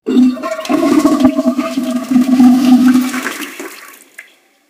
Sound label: a sound that might play, luxury